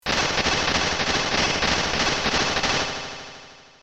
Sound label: mechanic walk; ff3us